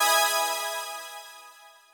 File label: Clear Emaj7; Mixolumia